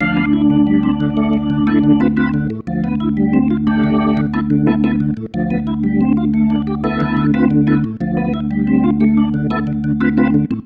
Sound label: Pattern, Organ